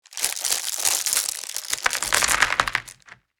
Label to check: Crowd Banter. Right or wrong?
Wrong